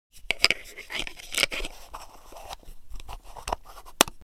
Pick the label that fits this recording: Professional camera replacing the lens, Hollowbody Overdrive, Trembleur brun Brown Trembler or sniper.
Professional camera replacing the lens